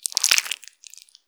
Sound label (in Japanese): 膣内射精